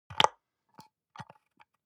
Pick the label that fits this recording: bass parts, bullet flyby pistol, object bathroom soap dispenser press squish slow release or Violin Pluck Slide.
object bathroom soap dispenser press squish slow release